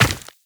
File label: Run Rock, Footsteps; Warframe; Tenno